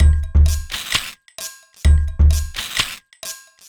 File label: Beat (130BPM), household rhythm; Loops